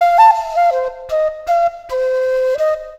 flute, ethno; eJay

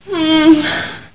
pant; Battle of the Superstars

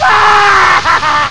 flamed; Hardercore Sounds

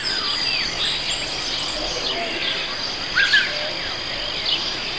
amb forest; wh40k

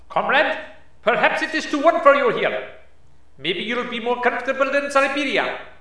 DEFEAT; CD Action 28b; east front

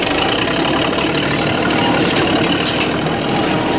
Tank; panzer

